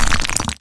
DMG STONE

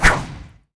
SWORD

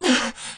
Hit

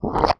toad hit

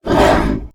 Attack

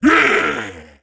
gladiator ATK

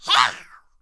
ball red

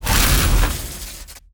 Elect cast

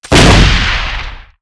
Weapons Gun

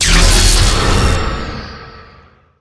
Lightning Shild

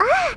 hit fairy

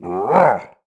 demon hit

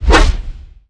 Whoosh

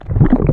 atk slime

